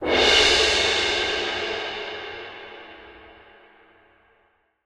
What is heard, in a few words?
big Win Text Change; secret of the stones